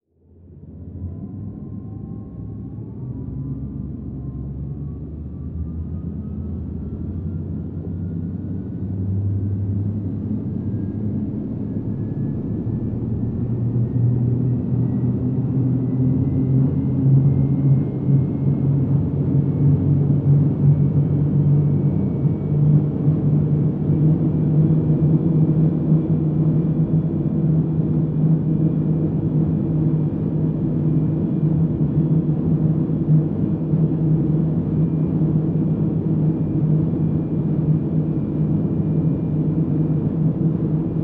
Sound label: Underwater Water Ambience Boat Motor Engine High Speed Running Take Off Run Slow Down